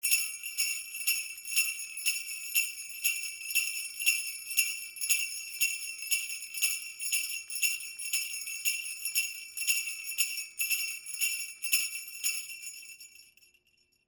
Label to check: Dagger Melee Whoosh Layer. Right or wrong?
Wrong